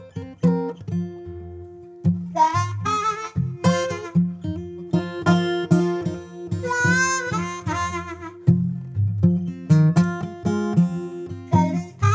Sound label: blues